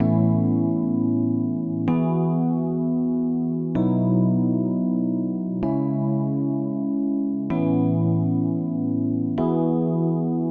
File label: Rhodes Emo Pop Chords 128; Sample Banks; Melody; Keyboards